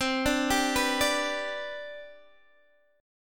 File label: C Major 7th Suspended 2nd C down Guitar DADGAD, chord